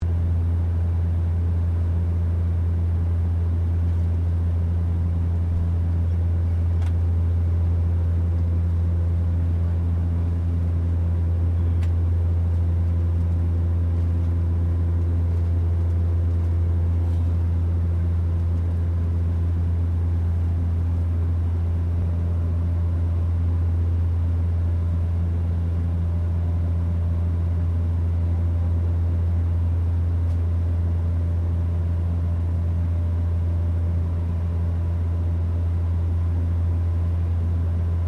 AIRCRAFT BOMBARDIER DASH8 FLYING INTERIOR, Dash8 Pasenger plane; Airplanes (Sound Effects:Vehicles)